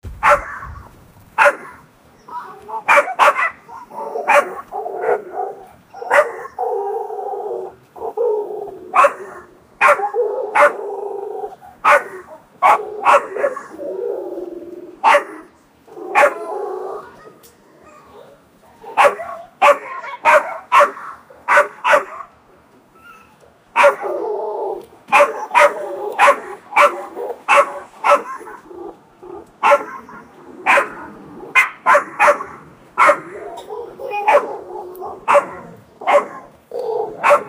cat and dog fighting

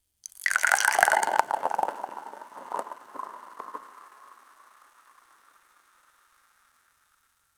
pouring espresso on iced, coffee

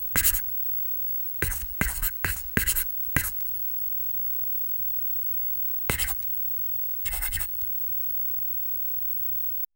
slowly writing with a pen; stationary

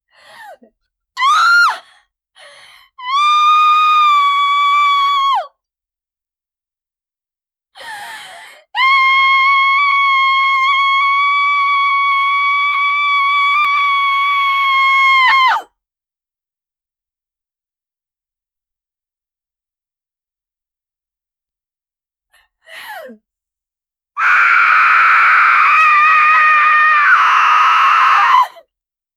sound of epilator plus, pain

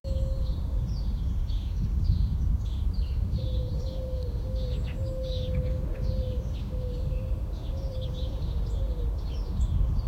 soft morning ambience

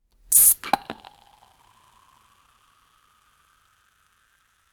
opening wine cog, liquids

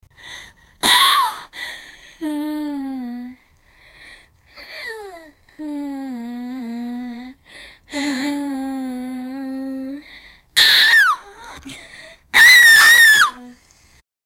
girl reation to eating, burning

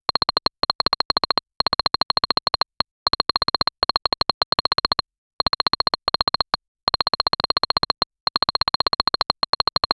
soft arcade button clicks; chiptune

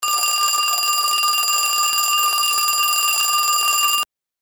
mobile ringing; communications

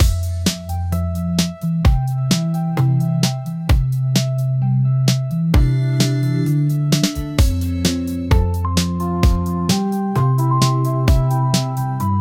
create a mischievous and, whimsical

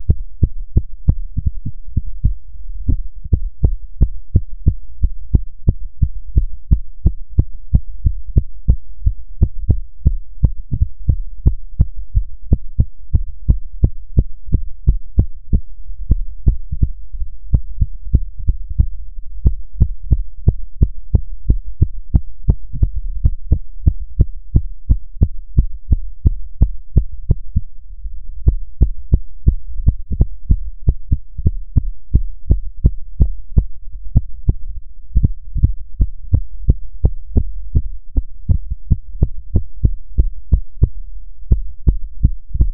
heart beating louder and; anxiety

